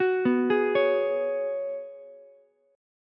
G sharp Minor 11th, Ukulele C6; arpeggiated; 11,11,16,16

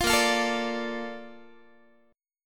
A sharp Major 7th, Guitar; strummed

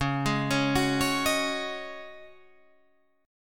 Db Suspended 2nd Suspended 4th Db Guitar Open G

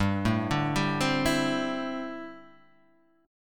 D 9th Suspended 4th, chord

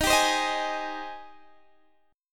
G sharp Major 7th D sharp

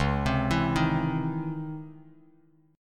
C sharp Minor Major 9th, Bass Standard; C#mM9; arpeggiated; JGuitar

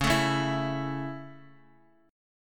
C sharp Minor Sharp 5th down Guitar; C#m#5 strummed; JGuitar

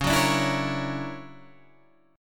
D Augmented Major 9th Db, guitar

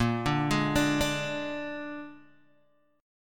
A sharp 7th A sharp, Guitar, Open G

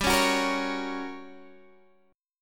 G Minor Major 7th Flat 5th G, Guitar, Standard; strummed; x,10,11,11,11,9

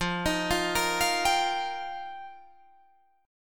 F 9th Suspended 4th F Guitar Drop D, chord

